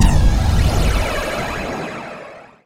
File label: teleporter ready